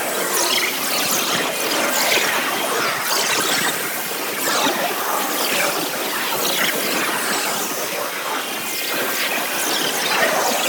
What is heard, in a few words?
Portal Loop; Ambience; Gameplay; Warframe; Entrati